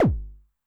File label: BD MOD [Pitch+Depth], 909mod, BASS DRUM